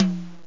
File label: Hi Mid Tom; Drums